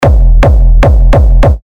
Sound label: Kick F; Batholith; terra kicks; Revealed Terra Kicks